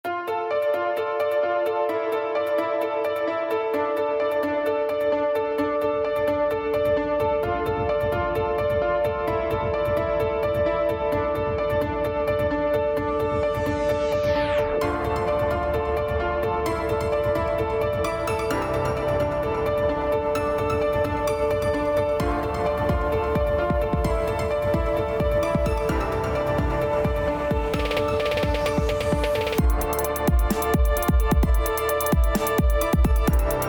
free beat

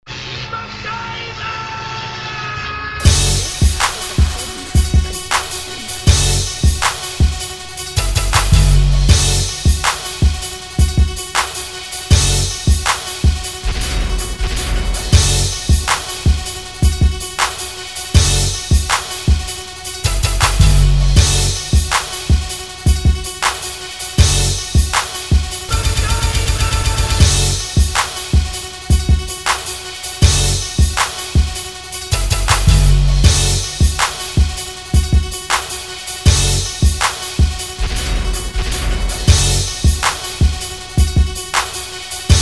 Hip Hop

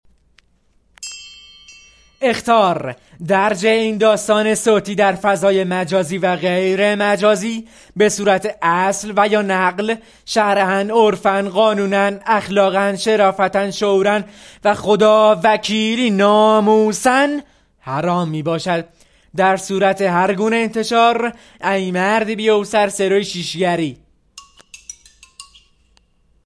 Warning

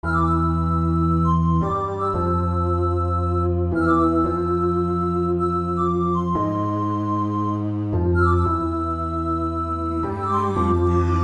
Trap

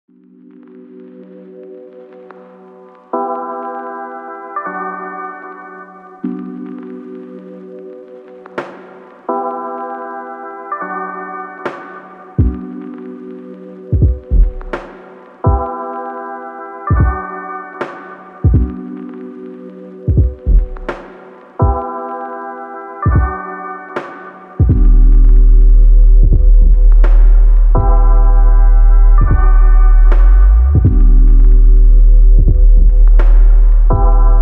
Rap

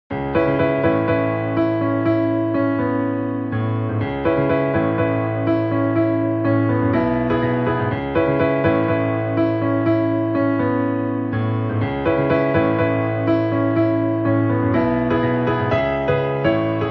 Piano Version

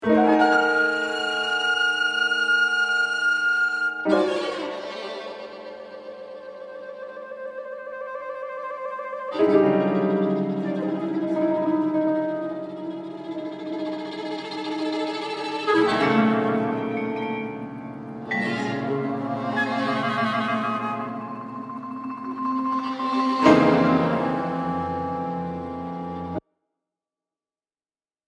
melody

